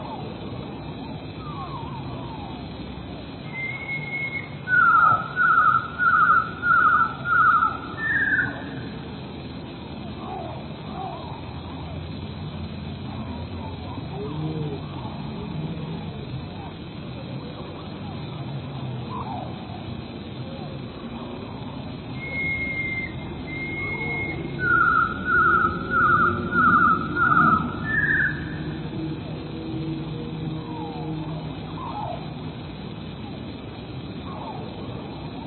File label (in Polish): spiew; hałas ze stacji kolejowej i ruch samochodowy z pobliskiej szosy